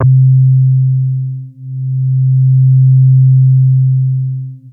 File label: Big Synth Basses